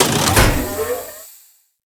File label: Gas City Claw Lift Start; Ambience; Props; Warframe; Corpus Gas City; Props Remaster